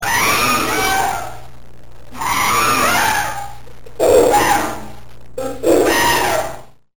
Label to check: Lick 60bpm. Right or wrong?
Wrong